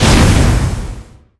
hit croc

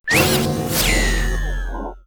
port start

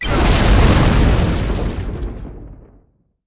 item missile; SpaceTrain

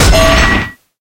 hit

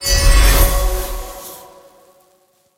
labyrinth revival

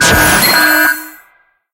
gimmick siren song

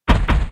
walk

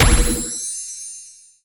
click event stage

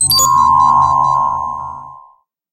push

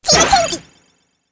team change

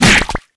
hit; paku

